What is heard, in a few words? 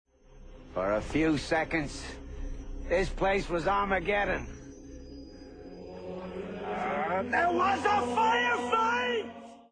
fire fight